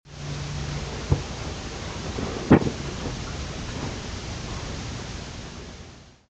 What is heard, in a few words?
footsteps